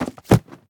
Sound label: Drop Book; The Town